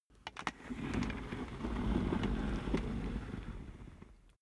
office chair worn trundle long

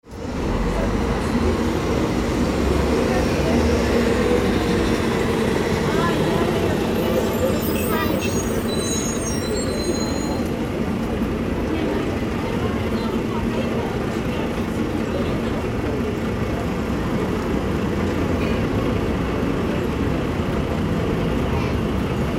trainstation tram bus

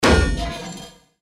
metallic impact

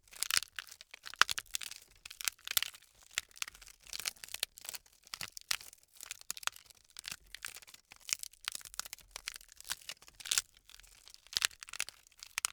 bamboo crack sequence

